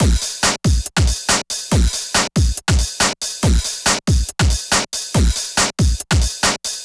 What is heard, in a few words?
Distorted Loop, Drums & Percussion, Electronic; Sonic Foundry (Sony Creative Software) - Electronic Point Blank